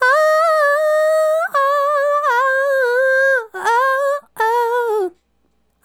Female Solo, Hip-Hop and RnB Vocals

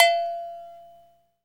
COW, Cowbells; Roland - Rhythm Section; Latin 1

